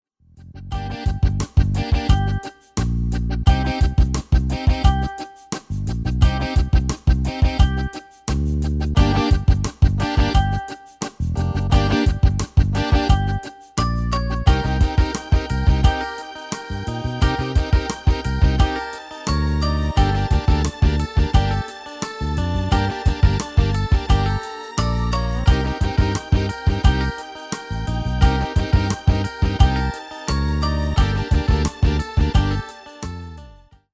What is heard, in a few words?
MIDI